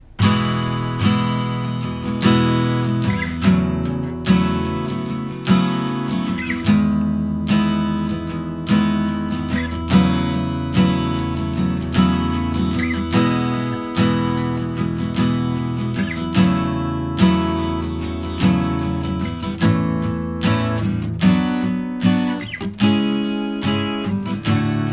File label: Acoustic Guitar